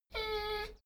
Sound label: dogo argentino whine short; natur-tiere; hunde